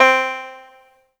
Yamaha TG100 Banjo C4

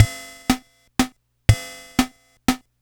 Yamaha PSS-170 Waltz

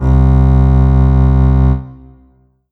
Roland SC-88 Contrabass C2

